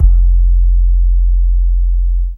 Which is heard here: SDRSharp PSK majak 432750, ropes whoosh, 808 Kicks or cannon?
808 Kicks